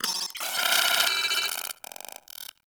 Arachnoid Micro Vocal Idle; Warframe

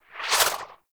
morph cast; Warframe; Khora

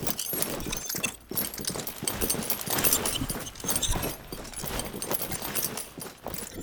Horse Steps Trot Loop Armour Foley; Warframe; Duviri Horse; Vehicles

